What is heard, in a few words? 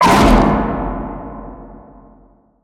scream, ghast; mob; Minecraft; Votive World